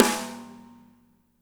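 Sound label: snare left; Spectrasonics; Burning Grooves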